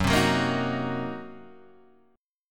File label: Fsharp 6th Flat 5th, guitar chord; F#6b5 Chord {2 3 4 3 4 2} strummed